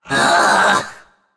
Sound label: ugh; smite; hades